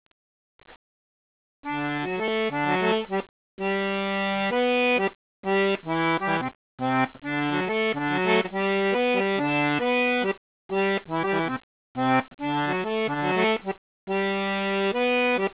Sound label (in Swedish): mazurka langsamt; Syvers; Arkivinspelningar